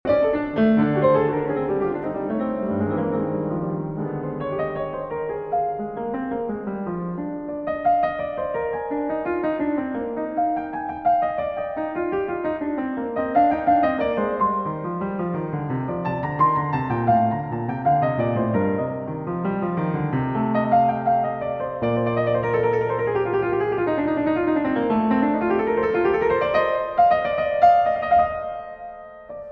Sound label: Prelude in E-Flat Major, Well-Tempered Clavier; Prelude No. 7; Book I, BWV 852